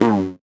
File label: VocoBit Noise, 8-bit; musicradar; 8-bit bonanza